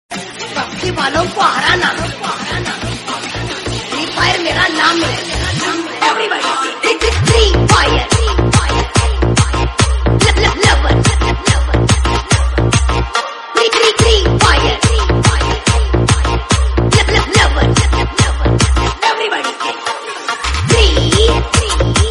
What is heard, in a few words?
Mass Entry Bgm